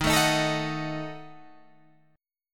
Eb Diminished, Guitar Open G; down

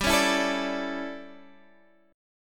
D Major 7th Suspended 2nd Suspended 4th, G

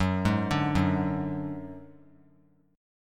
Gb Suspended 2nd Sharp 5th 3C,3F,3A,3D; Gbsus2#5 arpeggiated

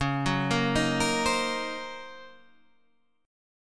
D sharp Minor 13th C sharp, chord

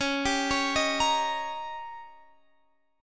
Eb 7th

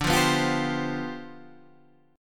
C Major 7th Suspended 2nd Suspended 4th D, chord

